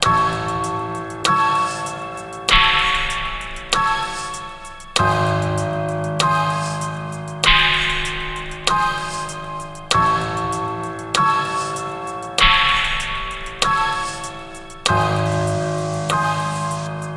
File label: Confrontational Tension; happiness bgm